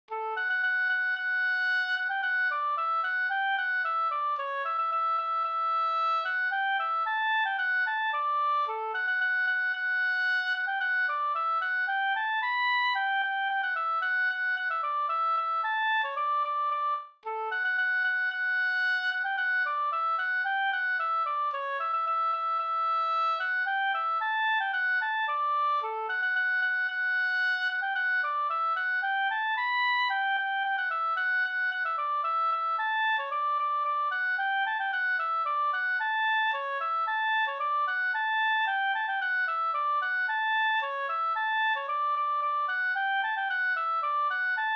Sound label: polka